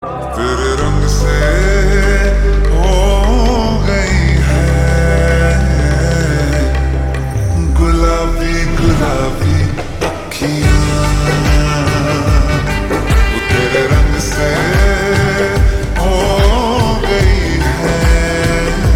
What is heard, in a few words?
Ringtone Slowed Reverb